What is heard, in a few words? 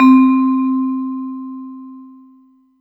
Yamaha EX5 Vibe C5